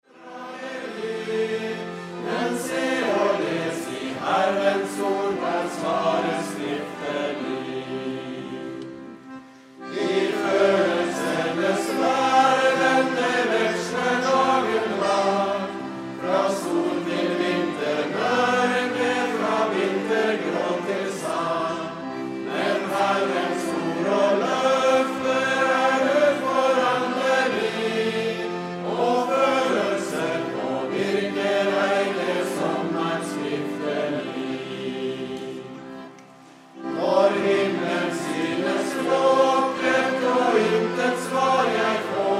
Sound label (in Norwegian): Musikklaget, Sanger; Bygland